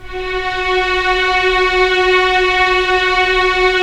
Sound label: STR Violins, Roland LA Composer; Roland - LA Composer vol. 4